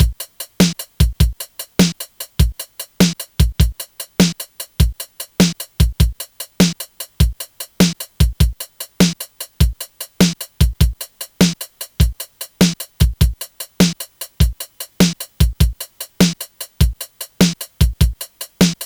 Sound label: drum pat 6-8; Music-X